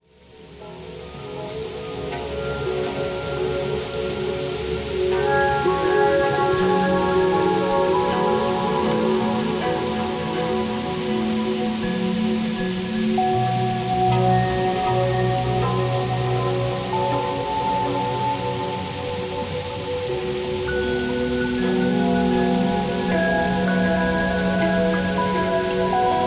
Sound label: Thunderstorm Rain